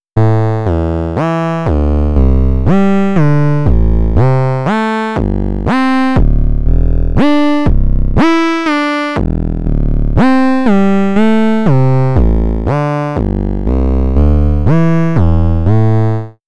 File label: programmable wavetable